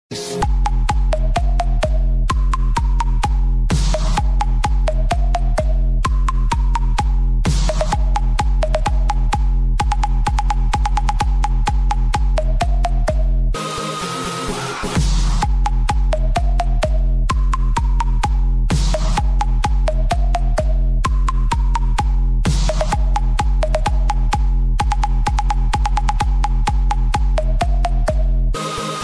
ringtone

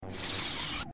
Lightsaber Off

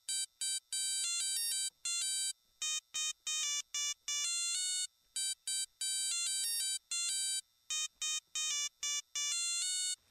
Pager Ringetone

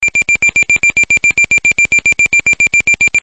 Minitor 6

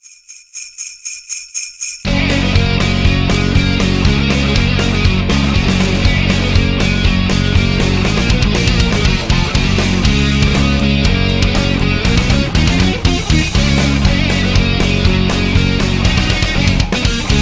Deck The Halls Metal

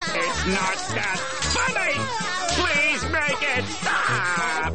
laughing; Spongebob

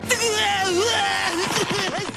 Deeuueeaauugghh

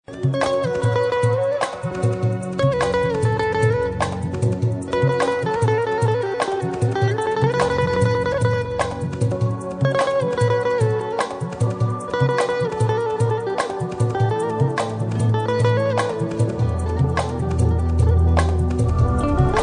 Guitar

